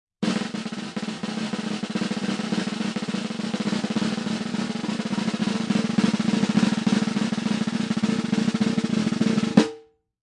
Cartoon Drum Roll, Anticipation, before a stunt or circus; Comedy/Cartoon - Drum Roll 01: Anticipation, before a stunt or circus act; Funny Sounds